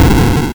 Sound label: Explosion; Guardian of Silverkeep; OGG Sound FX